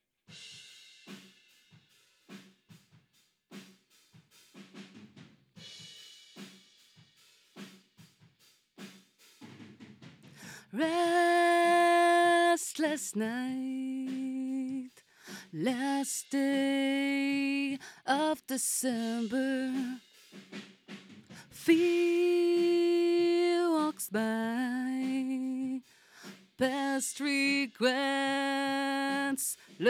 Vocal

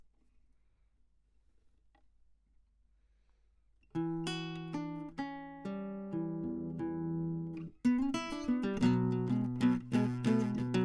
Classic Guitar

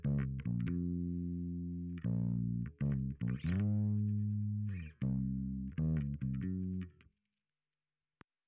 Bass Sansamp

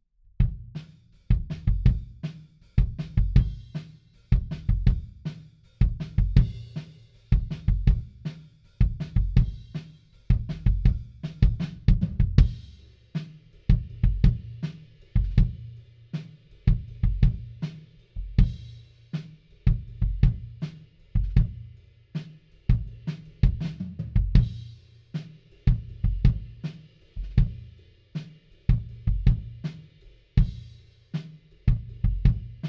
Kick In, 160 BPM

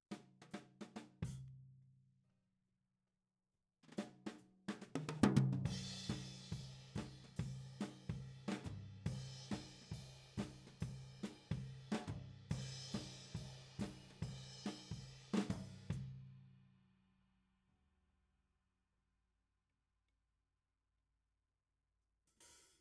Tom

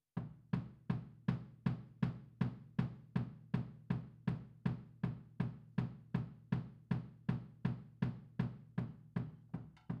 HiHat, 160 BPM

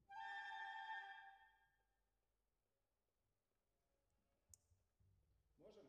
Cellos

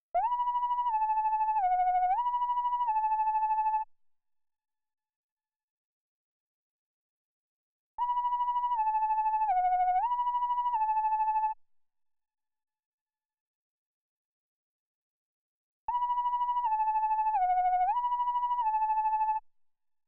MOOG

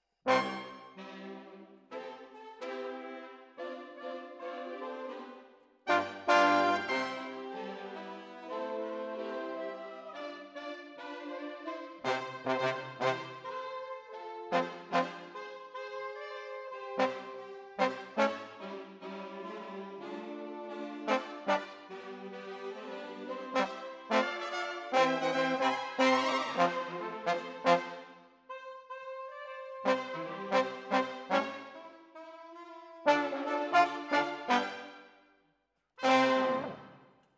Trombones, jazz for piano

